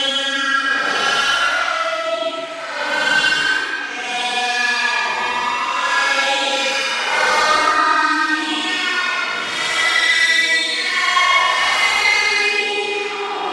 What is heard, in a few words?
h4x0r fog horn blues